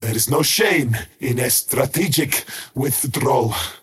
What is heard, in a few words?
crown teleport pain; skywrath mage